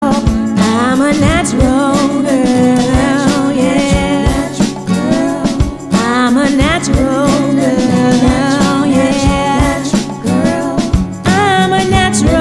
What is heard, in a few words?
song; natural hair song